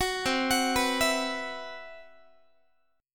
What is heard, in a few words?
C Major 7th Flat 5th C, Guitar; CM7b5 arpeggiated